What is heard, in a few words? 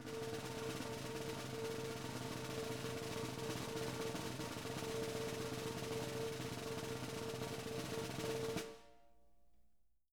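snare roll pianissimo; Sampleheads; Peter Erskine Living Drums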